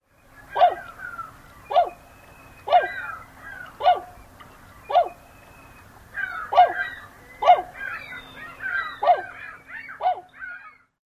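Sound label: low-pitched hoh! calls, Tundra Swan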